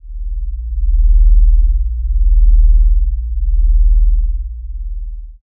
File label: space drone